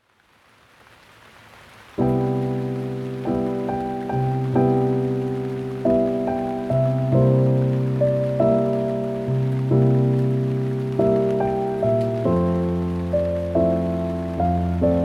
piano, moonlight rain music

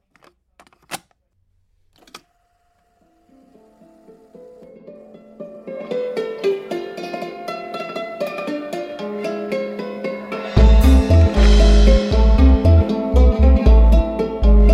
dulcimer loop

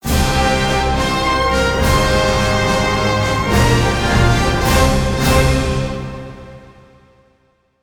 cadence with hit

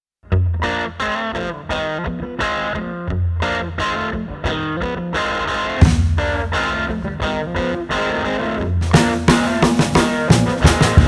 blues band

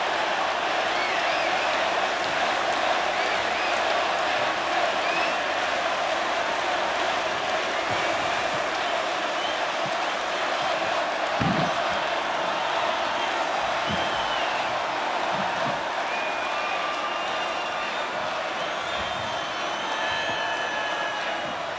crowd chanting

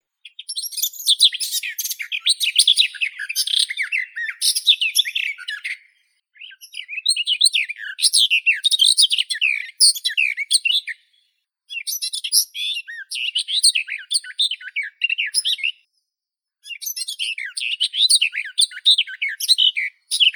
slavka sadovaya sylvia borin

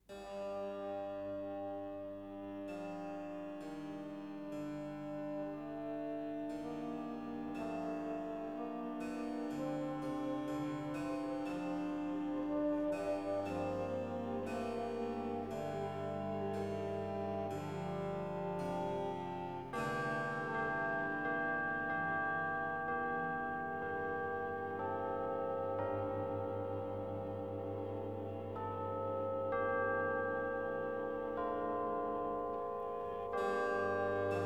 for cello bassoon harpsichord and bells; alfred shnitke; hymn iii

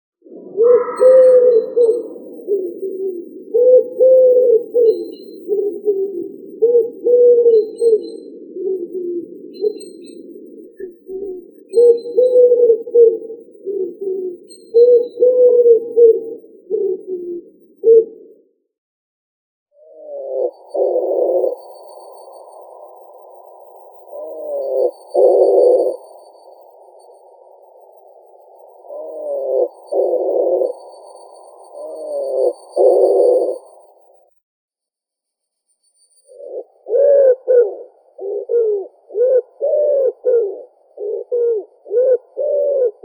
vyahir columba palumbus

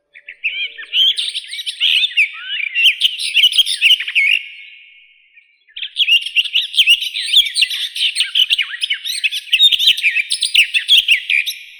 slavka sadovaya sylvia borin; zvuki prirodi

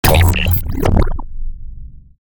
bubble hit complex short

